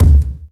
tight lows kickdrum sound D key

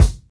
clean kickdrum f key, Loudest frequency: 733Hz; kick drum samples

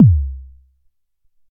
warm sounding bass drum single hit e key

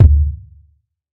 deep kickdrum d key

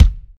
clean kick single shot E key; tuned drums e key

